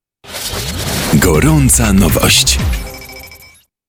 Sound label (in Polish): Jingiel; Goraca Nowosc